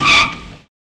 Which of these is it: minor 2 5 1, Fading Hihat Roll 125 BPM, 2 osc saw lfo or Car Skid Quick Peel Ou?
Car Skid Quick Peel Ou